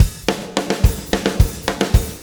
PULL FBAR, Backbeat; Spectrasonics

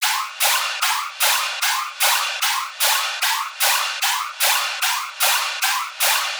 synth loop hype layer 150; Virtual Riot

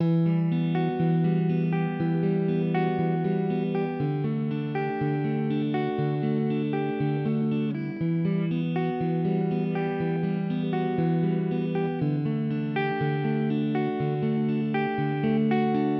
Guitar Loop 120BPM Em; Instrument Loops; Guitars; Chords & Melodies; 99Sounds; Monosounds

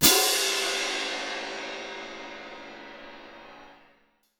CYM MF -L, Percussion; Miroslav Vitous - Percussion & Harp

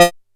F4, Bass; E-MU Producer Series Vol. 6 – Analogia Project; Boom da Bass

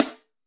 SN, Snare; AKAI S6000 CD-ROM - Volume 4

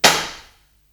Hihat Foot, Psychedelic Drums

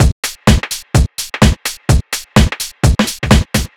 No Loop, Drum Loops 127bpm; Zero-G; Soundclash Nu Dance Electro; Acidized Wavs; Ugly Funk

